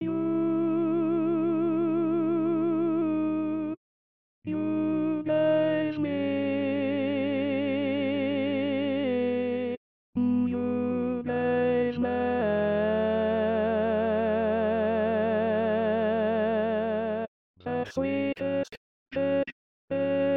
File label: Top in Cm